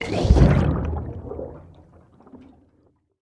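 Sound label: sounds of a barrel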